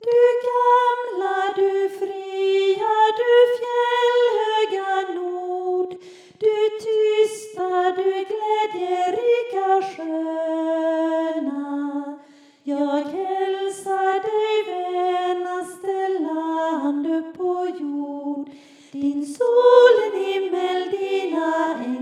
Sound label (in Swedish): Du gamla du fria Bas